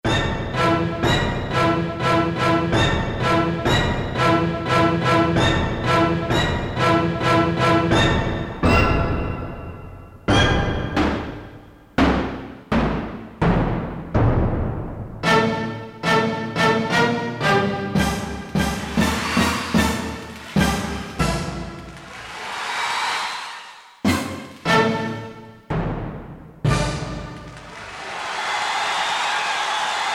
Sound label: O-Hit, Orchestra